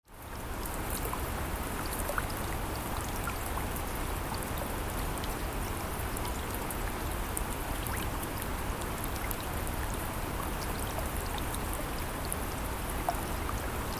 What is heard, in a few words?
gentle rain on water; MSB Team